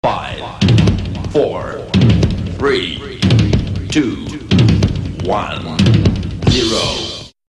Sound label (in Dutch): Countdown Tromroffel; Kermis Algemeen Deel 3